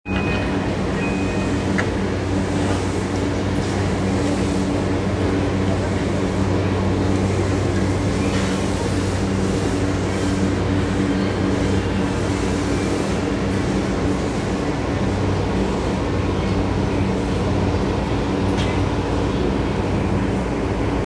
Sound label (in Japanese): JR横須賀線鎌倉南, train sounds